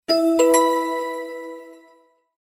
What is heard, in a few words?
Decisive, notifications